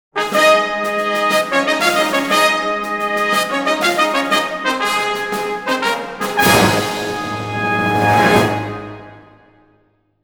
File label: Castle Fanfare